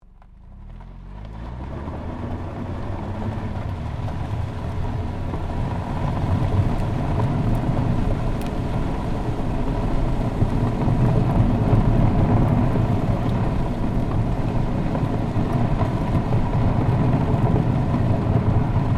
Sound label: CAR TOYOTA COROLLA ACCEL WHEEL ARCH GRAVEL LOOP STEREO, hatchback; 2009 Toyota Corolla; Cars